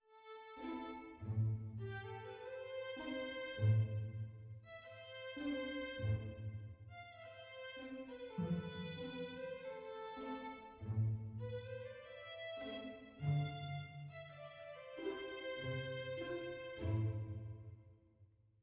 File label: mysterious soft